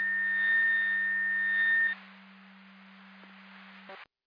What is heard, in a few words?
Siren; Hamburg FD